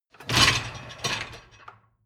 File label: dishwasher lower tray draw out short; maschinen; spuelmaschine